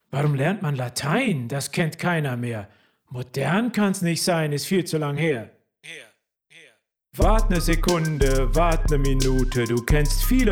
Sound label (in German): alles Latein 90 bpm